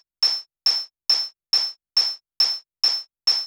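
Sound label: RESO open hi-hat, Techno 138 BPM; Best Service ProSamples vol.54; AKAI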